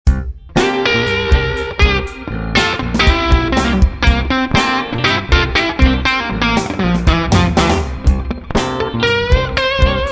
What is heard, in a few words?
Tight Funky Foot; admiralbob77